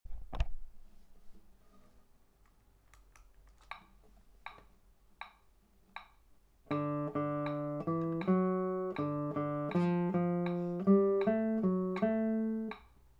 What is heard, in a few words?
GUITAR Chorus in D